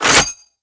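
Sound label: charging handle back; Valorant; operator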